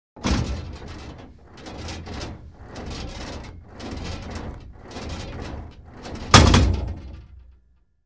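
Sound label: Gym Weight Equipment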